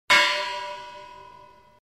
metallicheskiy udar

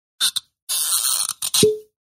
probka

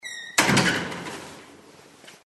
metal dver; открывания и закрывания деревянных и металлических дверей; Сборник звуков открывания и закрывания деревянных и металлических дверей

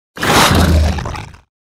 zvuk ataki dinozavra

